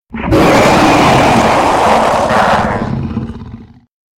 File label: wolf sound